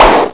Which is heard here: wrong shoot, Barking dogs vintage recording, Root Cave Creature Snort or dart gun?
dart gun